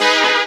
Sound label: Synth Shots; Vengeance Samples